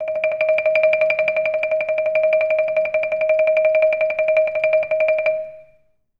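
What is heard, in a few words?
TREMOLO D#5, Orchestra; Best Service; Peter Siedlaczek's Advanced Orchestra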